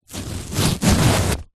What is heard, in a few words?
clothing new shorts size sticker peel off